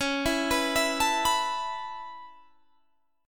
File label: C sharp Minor 7th Sharp 5th C sharp Guitar Standard; C#m7#5; arpeggiated; JGuitar